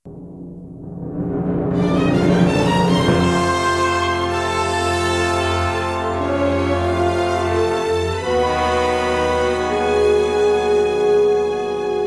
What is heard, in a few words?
success mission; Hidden Dangerous 2